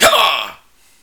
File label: hurt; metal bullets; player